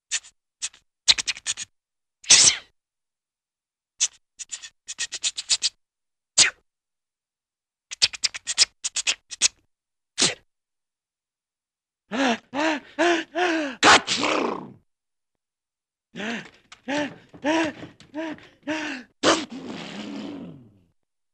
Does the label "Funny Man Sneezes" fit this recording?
Yes